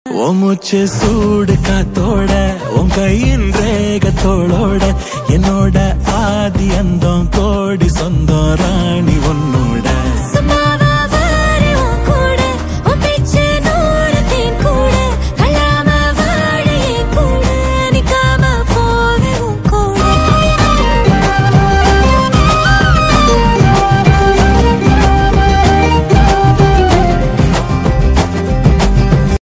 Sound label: Ringtone